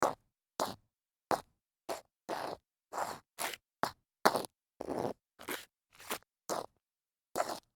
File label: scuff concrete with heels